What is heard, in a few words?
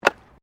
JUNK, Debris